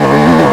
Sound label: throttle low, YAMAHA